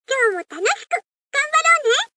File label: Alarm Off